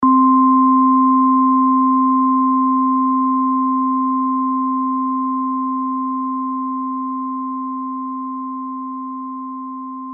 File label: synth bell